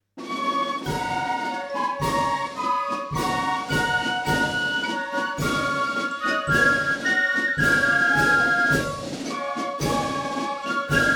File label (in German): Spielmannszug; Olpe; Deutschland hoch in Ehren